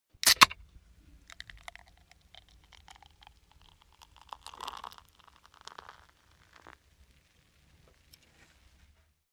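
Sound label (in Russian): открывает наливает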